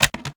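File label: sniper reload empty fast mag in; gmod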